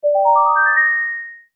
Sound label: quest completion